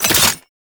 Wrist Gun React; Warframe; Tenno; Incarnon

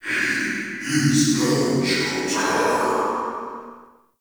Ghost Soldier Vocal Idle; Enemy; Wandering Ghost Soldier

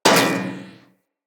Bullet Impact Debris Pipe; Weapons

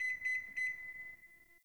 Bar Microwave Beep; Warframe; Nineteen Ninety Nine; Hub; Hub Pub

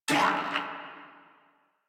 Pain Crewmen; Warframe; Corpus Crewmen; Crewman D

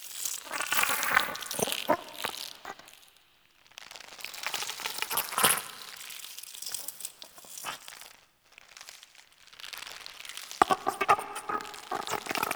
Exterior Hub Plant Chatter Loop; Warframe; Infested Eris

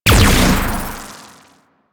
Plasmor Shot, Shotgun; Warframe; Weapons; Corpus; Arca Plasmor

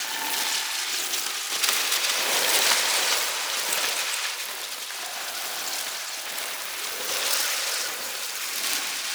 Floating Pages Activated Loop; Ultimate Abilities; Zenurik; Warframe; Focus

